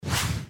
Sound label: Slip